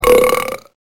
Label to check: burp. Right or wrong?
Right